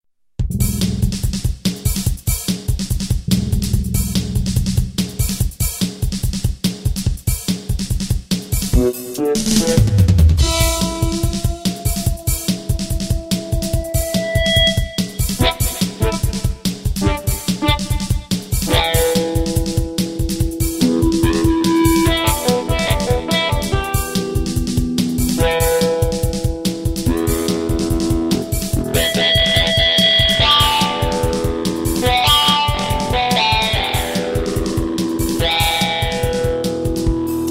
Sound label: drums guitar